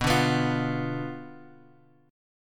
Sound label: B Diminished B, guitar; Bdim Chord {X 2 0 X 0 1} strummed